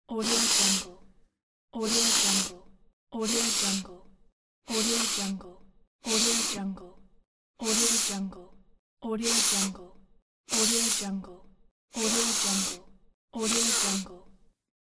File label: man sniff pack